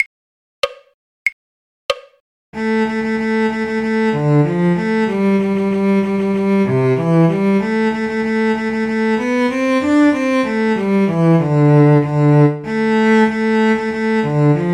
cello; drunken sailor; folk

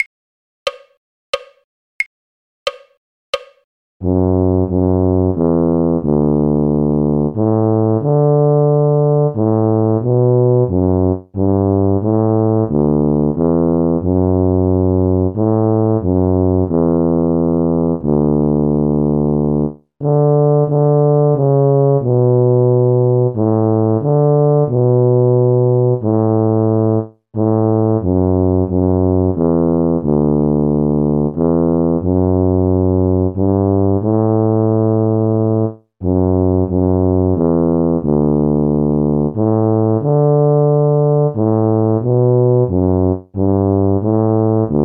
tuba; carols

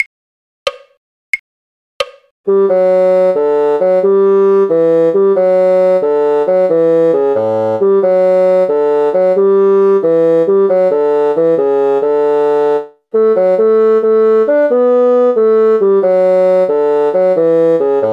bassoon; folk

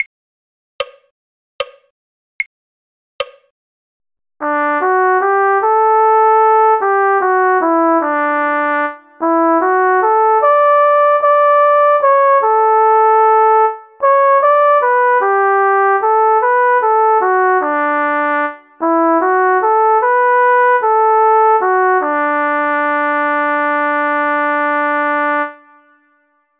cornet; barbara allen; folk

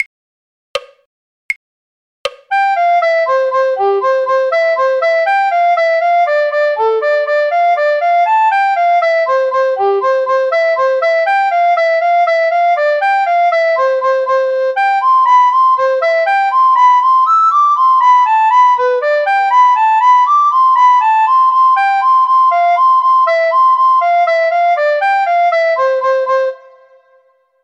recorder; The Irish Washerwoman; folk

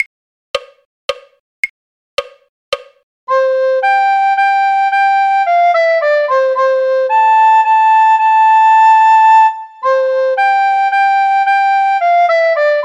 recorder; folk

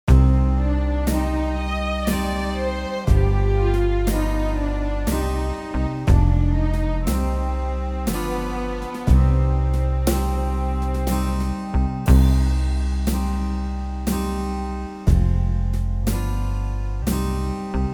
backing, folk; david of the white rock

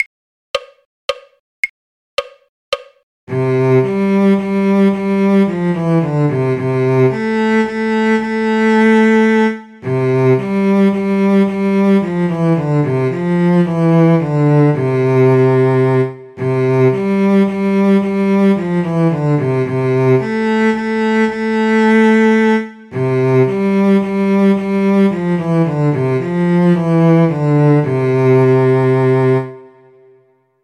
cello; lavenders blue; folk

